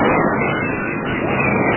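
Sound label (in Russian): океан шумный резкий птица активный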